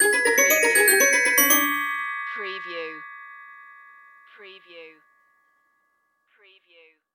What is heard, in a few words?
Music Pizzicato Strings